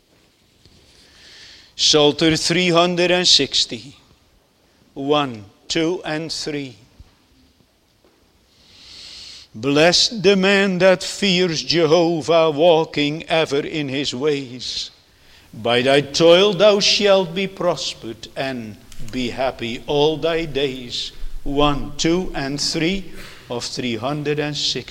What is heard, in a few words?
Singing; True Blessedness